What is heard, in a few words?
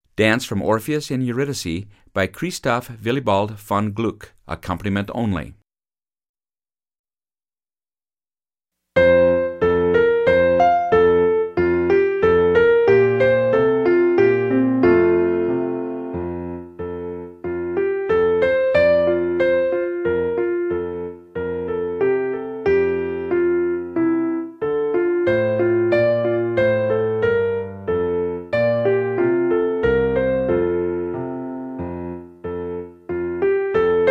Horn Accomp only; French Horn; Piano Only – Performance Tempo; Dance from Orpheus and Eurydice